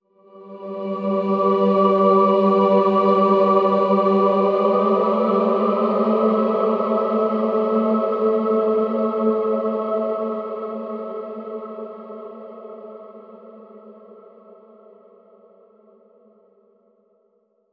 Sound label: Heavenly Voices Angelic Bend